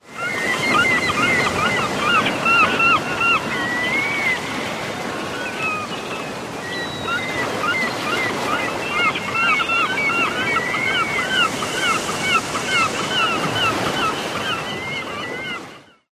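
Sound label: Iceland Gull, distant colony; UK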